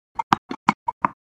Tennis ball hits the ground multiple times

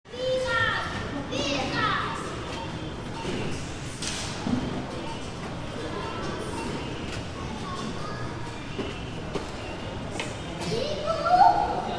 Shopping center local store ambience

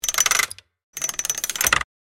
Safe vault dial lock turning click

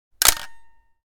Camera shutter click taking picture

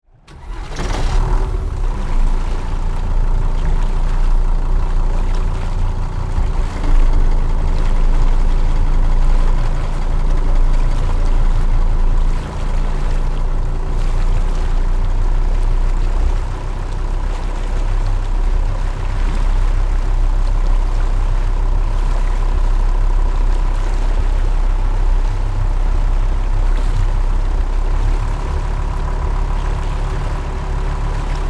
Motorboat starting up and away

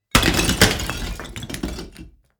Big blocks structure collapse on bricks and rocks

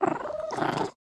Cat purring

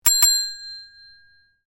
Subscribe bell ding; shorts sound effects

